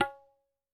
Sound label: Tabla N, Yamaha DTXpress-II, Perc